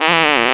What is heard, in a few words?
Fart; Nicktoons Nitro; arcade